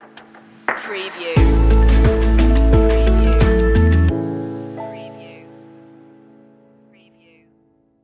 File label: in a warm place stinger